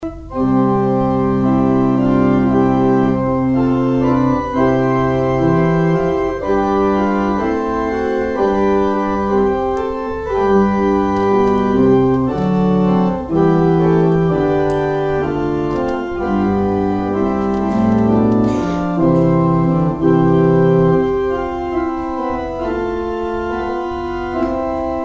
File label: organ outro; Organ exit music